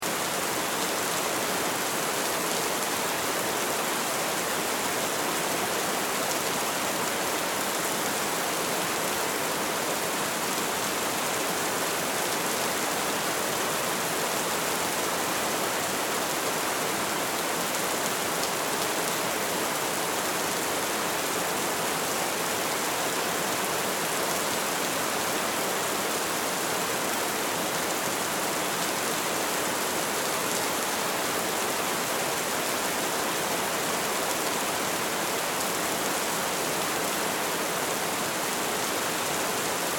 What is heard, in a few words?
Weather Rain Storm Ambience Loop; SFX Bible 6